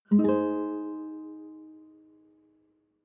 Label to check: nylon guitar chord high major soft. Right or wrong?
Right